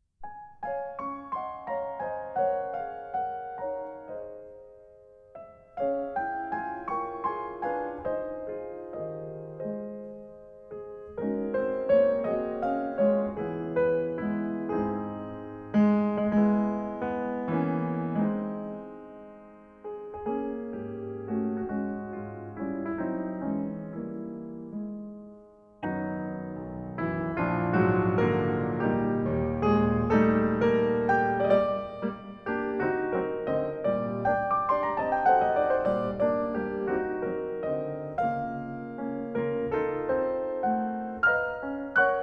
Nocturne in D Flat Major

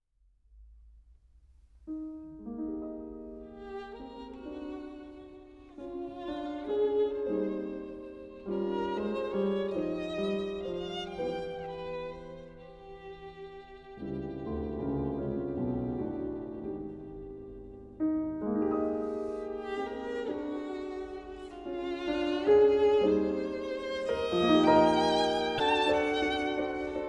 Suite for Violin Solo Andante; Suite for Violin Solo No 2 III

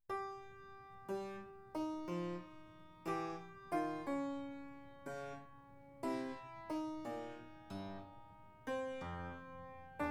Player Piano; Conlon Nancarrow; Study for Player Piano No 3d

